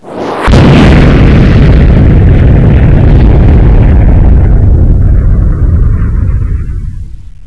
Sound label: nuke explode; svencoop; ambience